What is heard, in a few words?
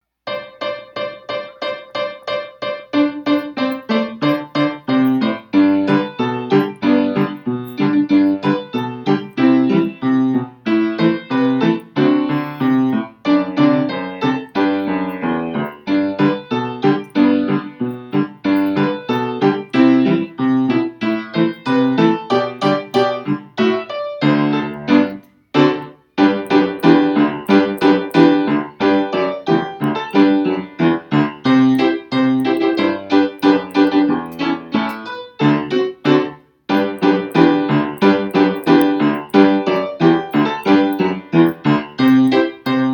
Jingle bells backing track